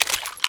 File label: 357 reload, weapons